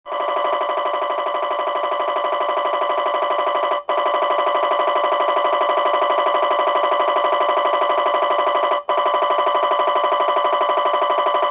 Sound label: MRI Functional